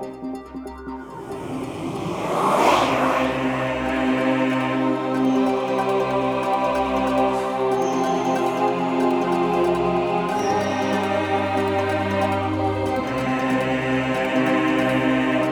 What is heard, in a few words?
Player Losing